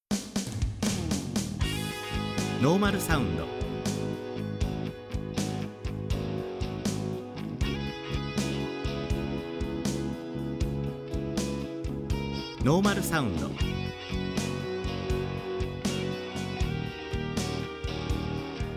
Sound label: rock